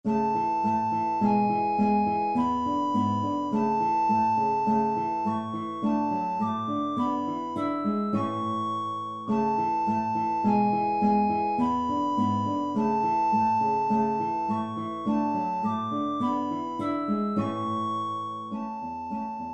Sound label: andantino; lewis alastair